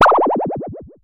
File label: Synare Filter; Star Instruments Synare 3